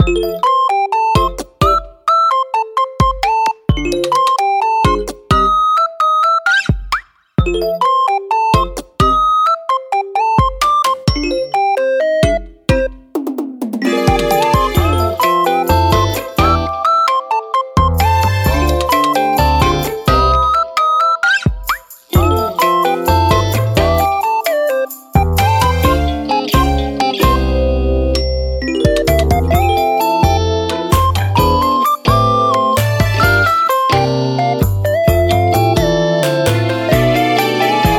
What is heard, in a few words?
promenade loop